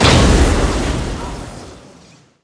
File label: flame burst, flamethrower; weapons